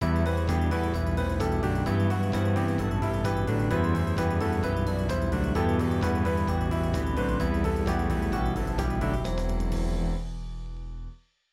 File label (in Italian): anni '80 revisited